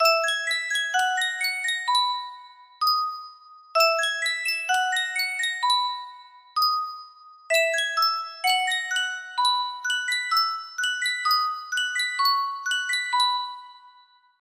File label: sankyo custom tune music box, music box melody